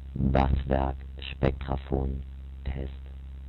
spectraphon vocal spectr; Make Noise

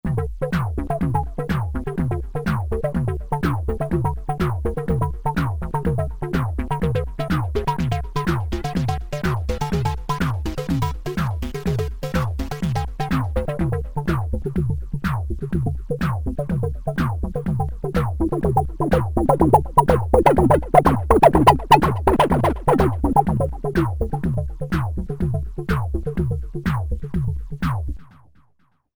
Beat

